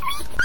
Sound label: death, monsters rat; Soundscape Overhaul